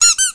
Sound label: rubberduck drop